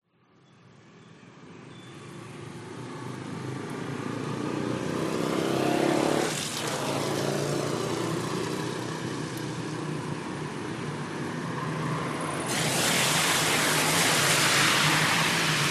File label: vehicles traffic pass by through puddle wet road night thailand chiangmai